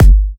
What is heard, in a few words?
Kicks Electro